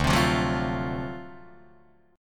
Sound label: D 7th Sharp 9th D down Guitar Open D